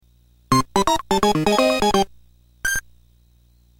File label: Defeat; Kirby Super Star Ultra